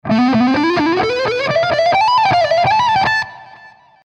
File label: am shred lick 112bpm